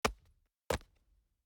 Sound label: fist into glove